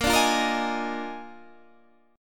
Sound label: D sharp Major 7th Suspended 2nd Suspended 4th, A sharp, guitar chord